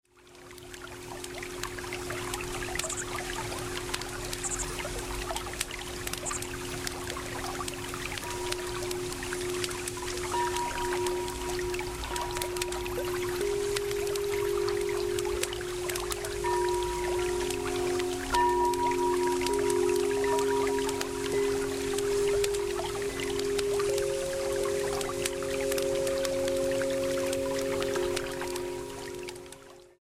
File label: Sleepy Night with Bowls